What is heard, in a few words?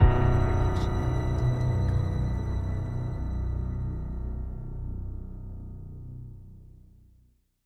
Mystery Ident; soundscrate